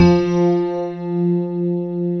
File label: GRAND PF F 2, AKAI S-Series